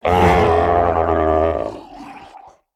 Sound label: death, procoptodon; zoochosis pack